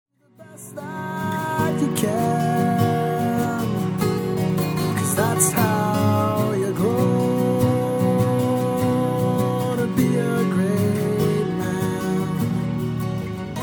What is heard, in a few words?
Lullaby; Atreyus